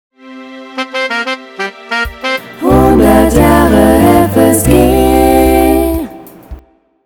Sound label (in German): Jingle Projekt; steinhundert